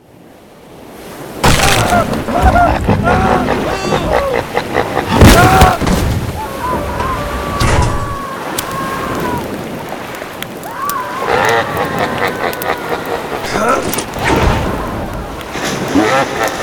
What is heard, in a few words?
box; CosmicRage